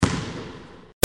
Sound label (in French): Balle de basket ds gymnase